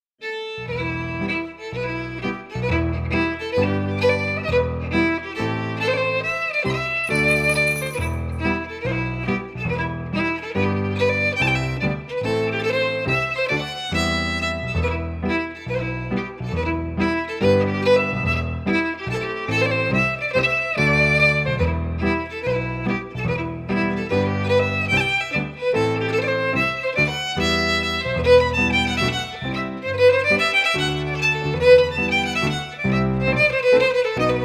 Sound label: FIDDLE PIANO; Jink & Diddle Book o' Tunes 2022